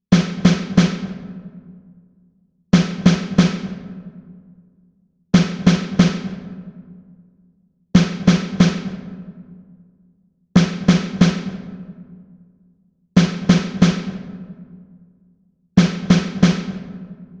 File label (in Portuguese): Toque - Caixa